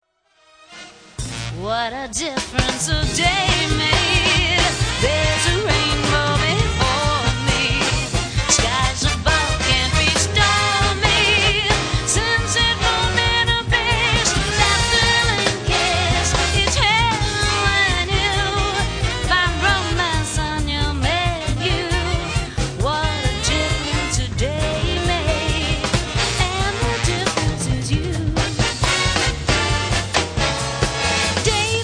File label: Big Band Arrangement